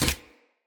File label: Unarmed Parry Impact; Weapon Impacts; Player; Combat